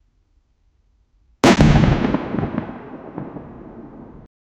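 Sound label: the sound of lightning strike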